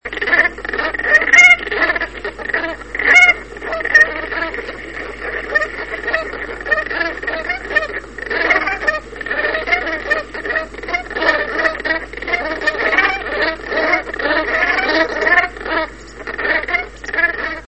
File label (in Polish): bernikle obrożne